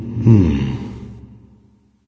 thinking; smite; ahmuzencab

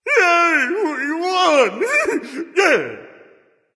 nv yay; Smite; ymir skin12

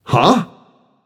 notice; smite; Vulcan

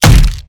impact crunch; Smite; Cabrakan

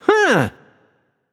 happy; smite; voicepack; osiris

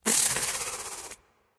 tongue; smite; voicepack; kali

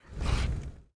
grunt; fenrir

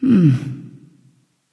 thinking; smite; voicepack; nike

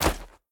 footstep; smite; god tiamat

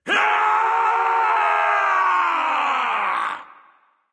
battlecry; smite; agni

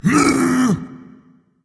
tongue berserker; cuchulainn

